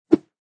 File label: Sword swings in air; Combat Swings